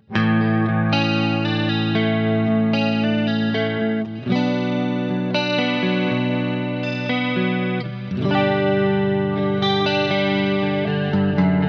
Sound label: Kemper vs Quad Cortex Clean Spherical Kemper